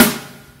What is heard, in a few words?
snare, drums; virtual drum sounds; custom drums